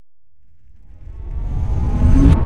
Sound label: Charge Laser Lower